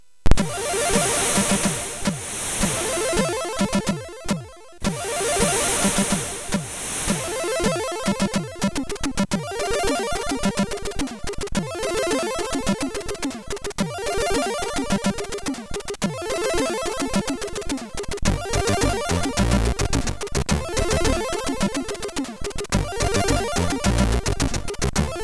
Shuffle, MOS6581R3

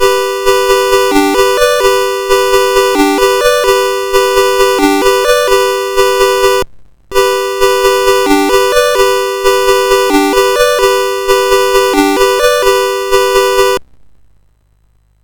sid MOS6581R4, GAMES; Forest of Doom

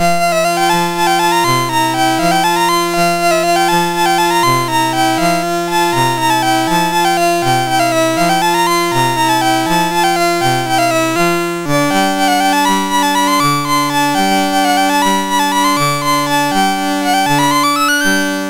Allegro, MOS6581R3

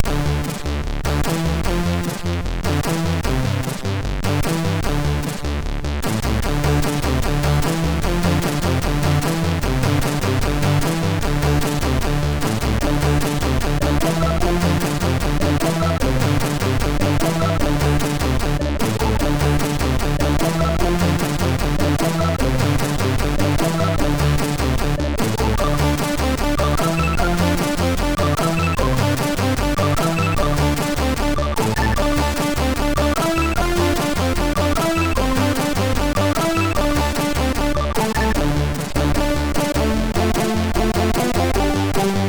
tune, MOS6581R4; Tripler tune 5

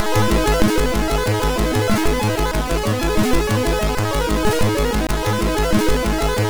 Outro, MOS6581R2

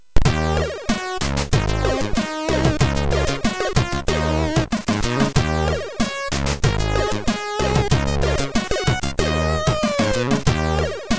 Just Jazz, MOS6581; MOS6581R3; hvsc

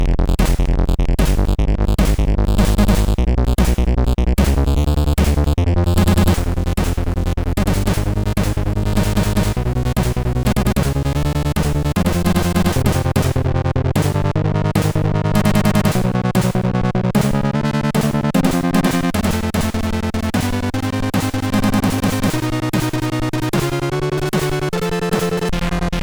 Attack, MOS6581R3

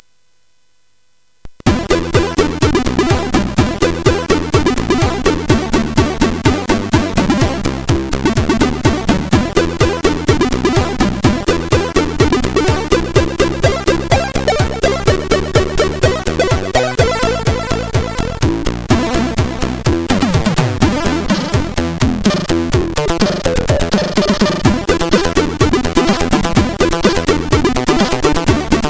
sid CSG8580R5; Outrun Europa

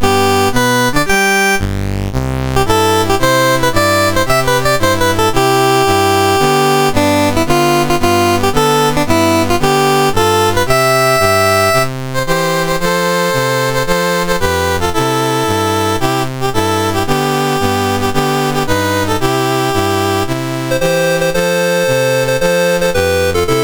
Seychelles, National Anthems, MOS6581R2; Dave Marquis

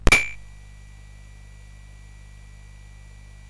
CSG8580R5, GAMES; Street Rod

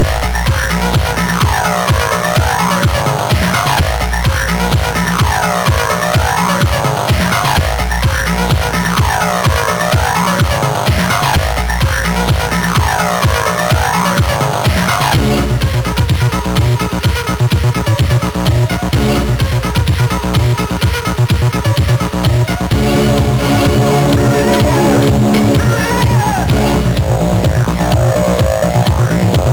Music Lover Mix, sid MOS6581R3

